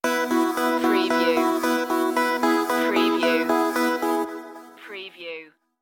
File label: modular motion stinger